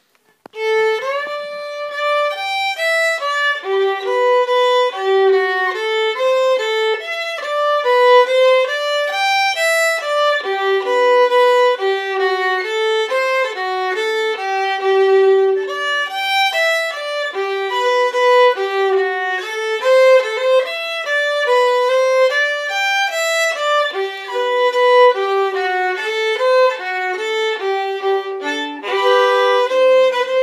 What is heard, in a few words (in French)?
Polka en sol